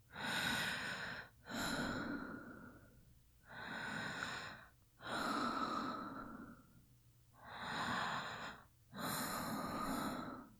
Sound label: Breathing - Slow